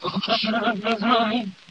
jibjab CHANT, Lo-Fi Jibber Jabber

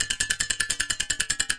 151 click clack

